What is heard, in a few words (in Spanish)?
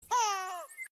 risa